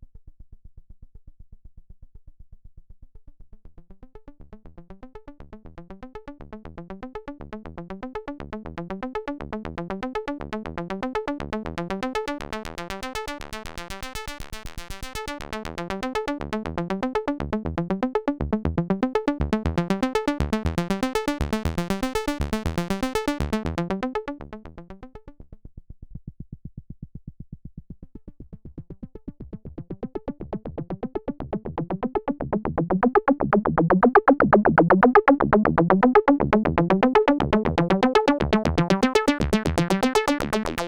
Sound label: Traveller Filter normal then bright